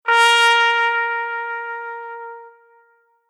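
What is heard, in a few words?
Horn Decrescendo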